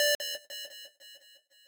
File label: lead6